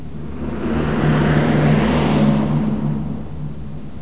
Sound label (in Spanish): COCHE; El Juego De La Oca Loca